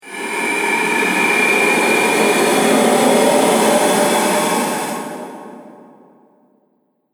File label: Dungeon Atmosphere, scary soundscape; Fantasy Sound Effect